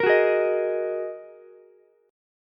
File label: G sharp 7th Suspended 2nd G sharp, down, Ukulele; strummed; chord